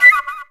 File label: D.FLUTE; E-Lab; X Static Goldmine 2; akai